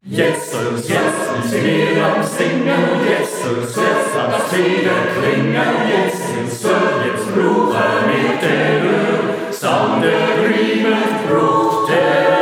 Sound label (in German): Mier sueche Tenoer